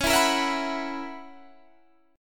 B Major 7th Suspended 2nd Suspended 4th Db, chord shape